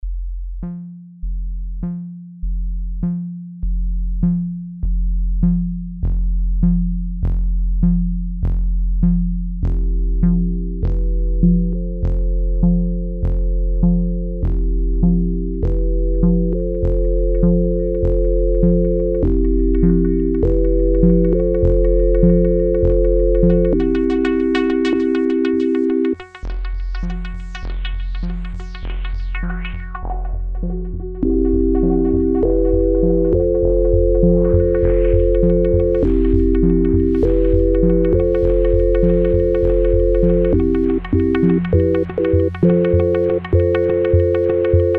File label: Procedural Beats